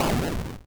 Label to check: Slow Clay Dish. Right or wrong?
Wrong